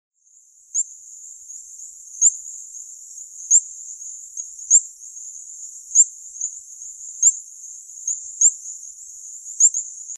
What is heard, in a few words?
Stumpffia tridactyla